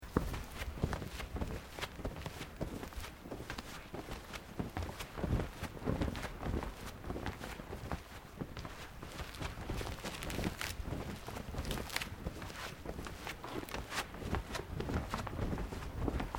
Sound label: FOOTSTEPS TABI TATAMI MATS SEQUENCE